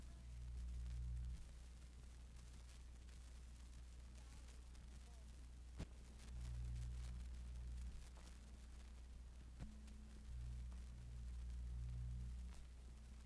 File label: line noise, Vinyl